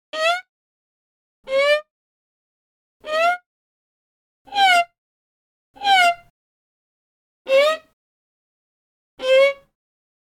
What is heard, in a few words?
Violin Glissando